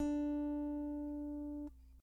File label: string2 d3